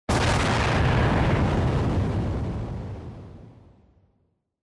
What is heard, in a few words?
Explosion, Dry